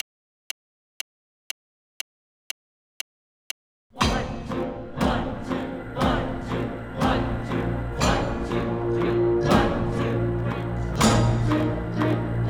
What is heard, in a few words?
Musicians plus pulse and drone only